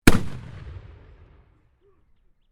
Canon Field Gun Muzzle Loader Firing Stereo, Black Powder firearms at a shooting range; 1800S; Gun Shots